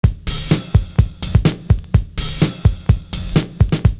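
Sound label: STANDART DRM, 126 bpm; Univers Sons - Kitch 70s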